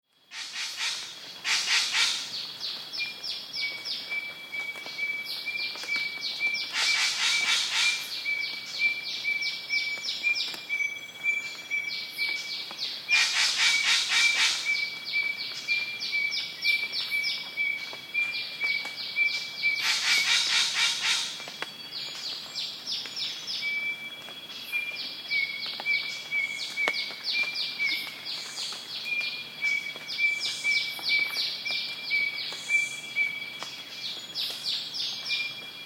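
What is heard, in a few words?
Queensland Rainforest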